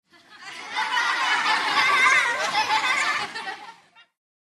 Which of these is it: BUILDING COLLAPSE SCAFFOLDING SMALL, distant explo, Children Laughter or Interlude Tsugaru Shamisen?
Children Laughter